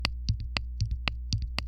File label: footboard comparison pro d2 piezo back